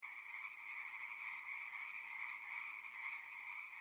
lago nutria; mekanta; jungla

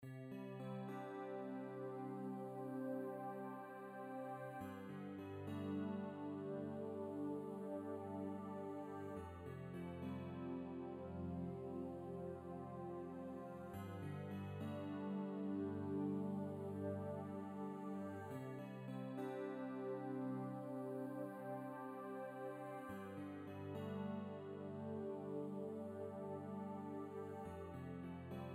música; SALA C; Mekanta

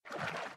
agua; mekanta; jungla

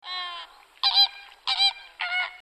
sonido flamenco; mekanta

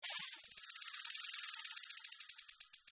sonido serpiente; mekanta

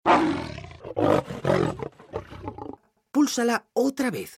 sonido leon; mekanta; pulsala

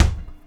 balon; primartis; sonidos

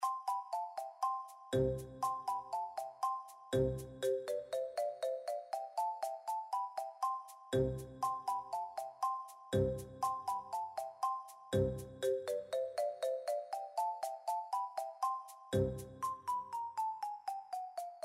musica premio; mekanta

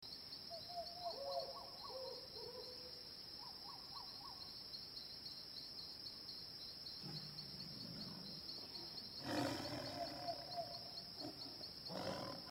grillos nocturno; mekanta

